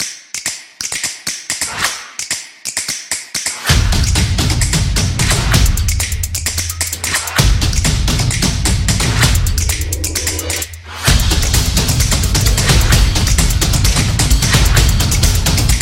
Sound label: Beat Drum